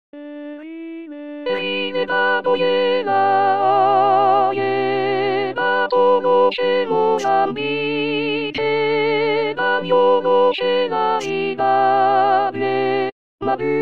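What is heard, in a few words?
alto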